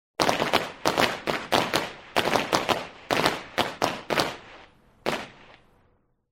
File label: Firecrackers; SOUND EFFECTS1